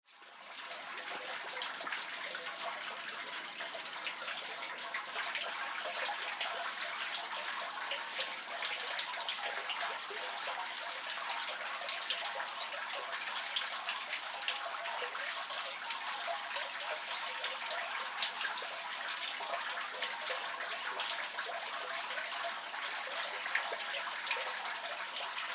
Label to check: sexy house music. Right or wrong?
Wrong